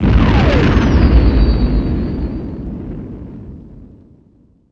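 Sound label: combat explode z95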